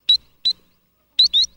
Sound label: Spot Sandpiper Alarm